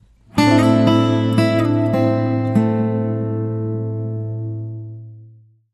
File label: Link Sting